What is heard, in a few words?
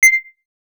soft scan beep; RedAnt ERP Mobile; iSales